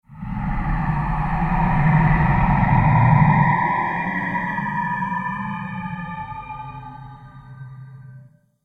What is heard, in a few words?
Creepy Absorptive Horror Transition; Scary Soundscapes; CraftedSignals